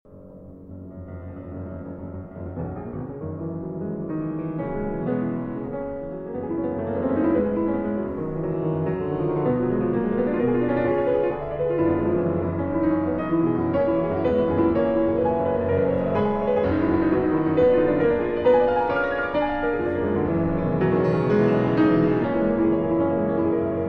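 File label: Variations on a Polish Folk Theme, Piano Works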